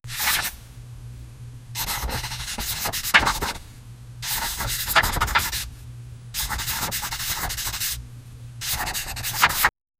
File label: pages turning pencil scratch